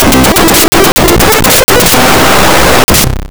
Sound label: jingle variation